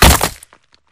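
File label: Bullet Light Ballistic Concrete impact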